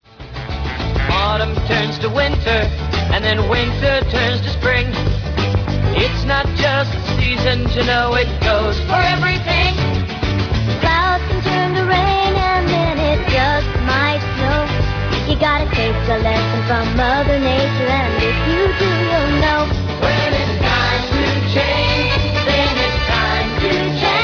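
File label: Brass Playing Mishaps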